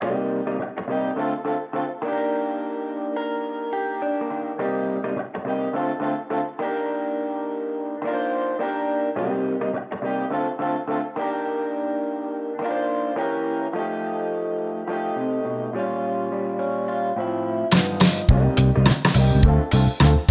1 chorus instrumental neo soul; weeklybeats 2024

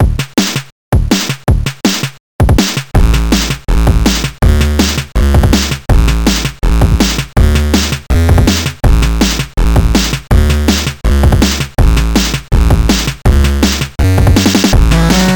polyend tracker chiptune; runner

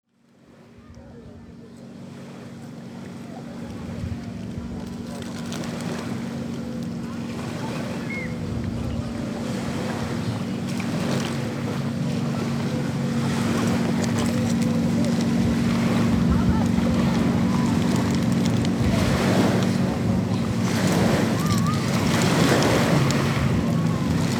fieldrecording beach nidri cicades

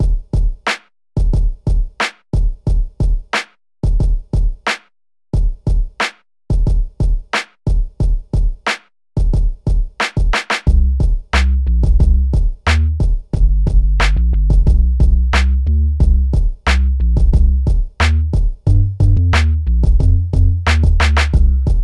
a beat

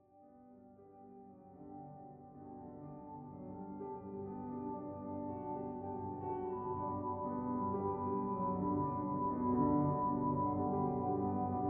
deluxe chill; weeklybeats-2016

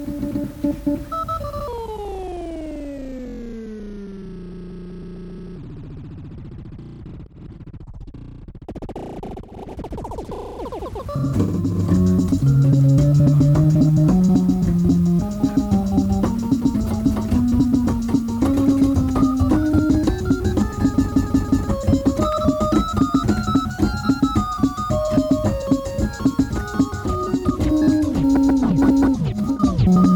hammond; by a stretch; weeklybeats-2016

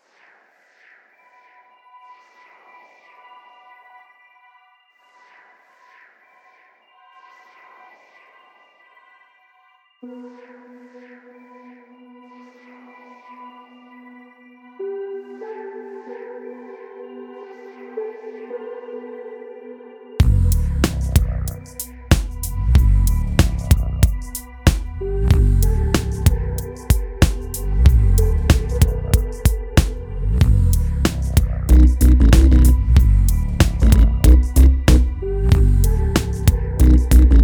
talk bass; weeklybeats-2018

